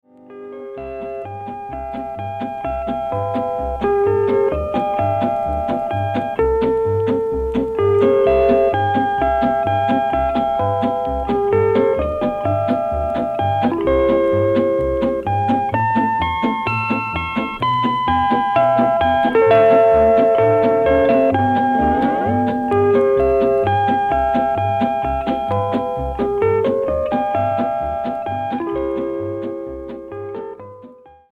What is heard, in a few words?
chimes